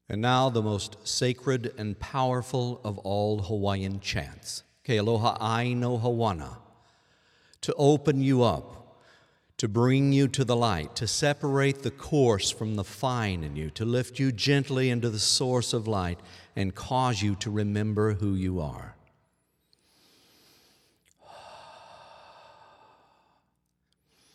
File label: Supreme High Chant, hawaiian sacred chants